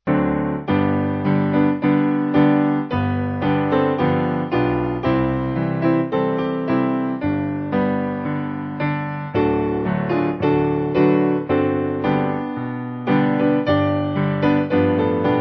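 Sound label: Piano, Music Backing Files for Churches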